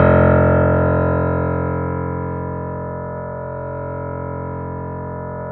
F 0, Keyboards Acoustic, GRANDP ST; USB Soundscan vol.17

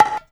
BONGOS; InVision Interactive; Percussion Slam

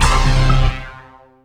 DANCE STAB; E-MU Formula 4000 Series Vol. 2

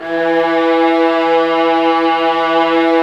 STR VAS, Amb f; Roland L-CD702; Vlas Sect3-4

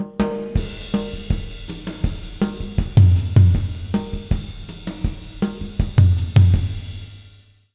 160, Jazz Latin Drumloops; USB Soundscan vol.08; AKAI

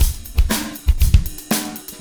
70s breakbeats loop; USB Soundscan vol.46; AKAI

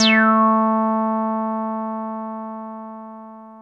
303 A3, TB 303, Synthesizer; Hollywood Edge; Giorgio Moroder Rare Synthesizer Collection

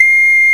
OCARINA, Fairlight CMI, WOODWIND; Fairlight CMI IIX, WOODWIND

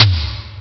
m1 etom lo; ST-XX Amiga Soundtracker Sample Packs

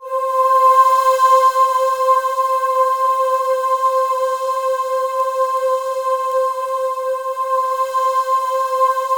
C5, Synth Leads

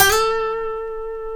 B, funk guitars; Spectrasonics; Hans Zimmer Guitars VOL-1; TAKA FUNK

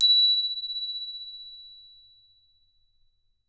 BRASS, BELLS CHIMES; Voice Crystal - Steve Reid Definitive Percussion

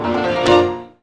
ZIMB HIT; Best Service; World Colours

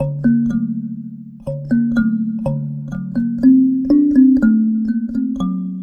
Kalimba, Ambient Melodics

